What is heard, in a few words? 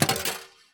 launcher, pinball